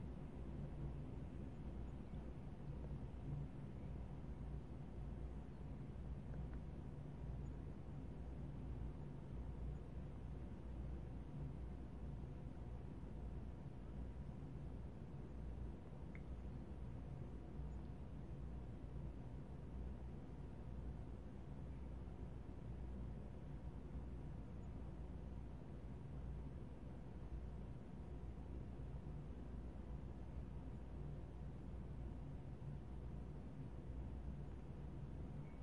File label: Roomtone; Frogsong